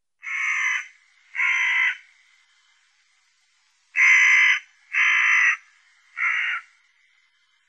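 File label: hooded crow voice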